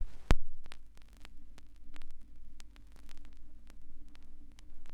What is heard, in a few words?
NOISE; Xsample VOL-9